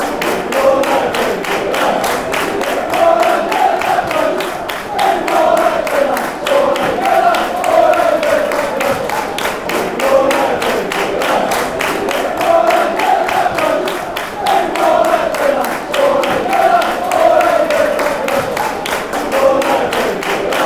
if you hate clap your hands fan chants; villa

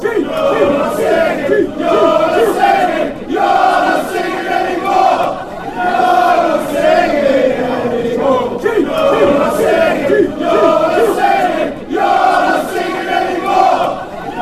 youre not singing anymore

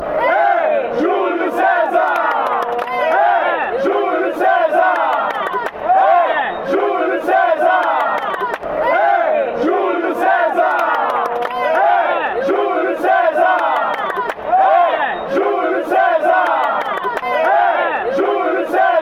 hail fanchants; julio cesar

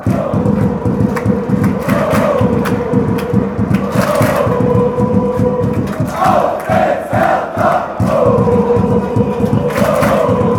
oh oh oh lets go, chants; hertha

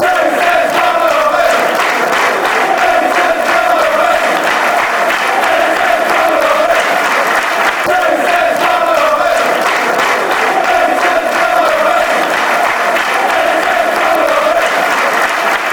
black and white, fan chants; billy davies

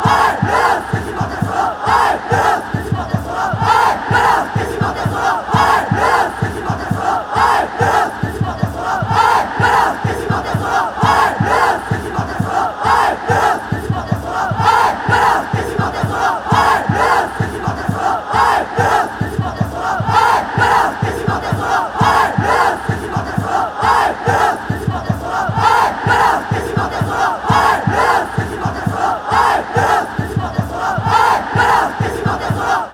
ale ale, fanchants

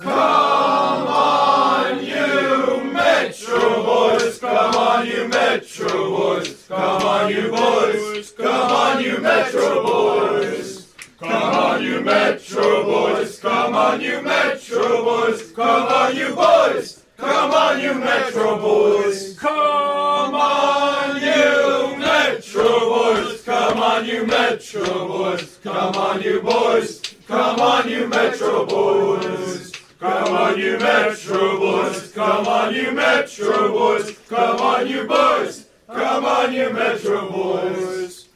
come you boys, chants; metro

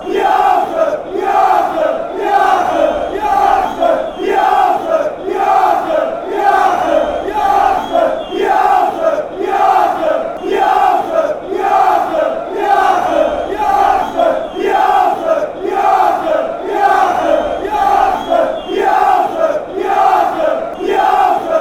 den haag chant

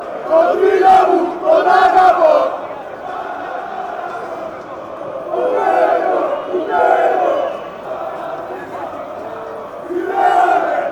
shouts, fanchants; olympiakos

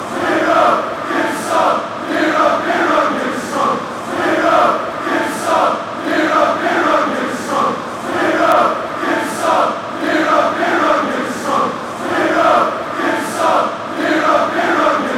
fan chants

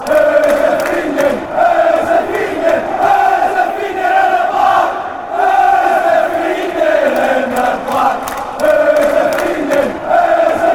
whose the fenian, fan chants

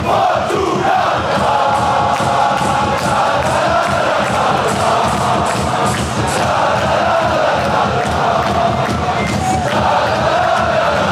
ohhhh, chants; fortuna

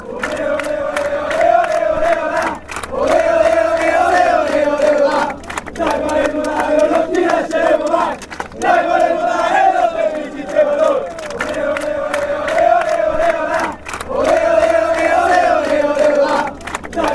ole, fanchants

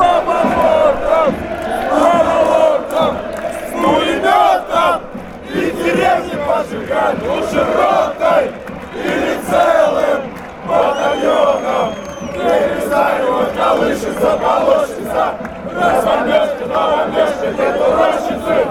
cska chant

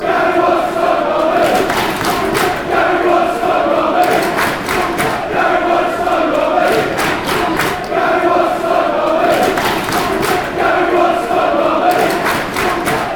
barmy army, fanchants; gary waddocks

